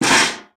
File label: metal sheet; wood metal SFX